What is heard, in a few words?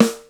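snare sonor, Yamaha Motif ES-Series; 300 Drum Machines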